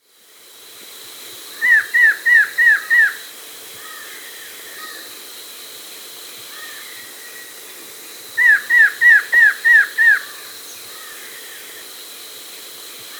Variable Antshrike (Thamnophilus Caerulescens), Andean Birds; S. America